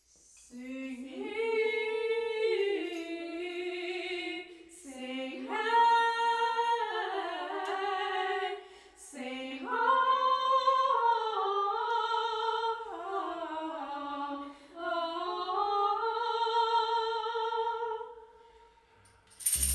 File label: Jugalbandi of Wind and Lo Fi Rain